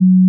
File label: G flat 4, pure tones